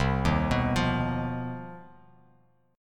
C Minor Major 7th Double Flat 5th C down Bass Standard; arpeggiated; JGuitar